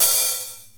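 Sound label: Open Hi Hat; Zoom RT-234; Kit B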